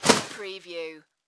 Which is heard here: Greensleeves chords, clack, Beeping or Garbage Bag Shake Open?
Garbage Bag Shake Open